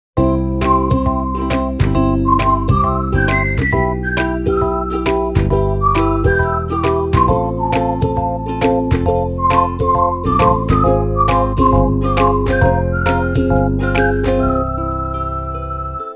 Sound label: happy ad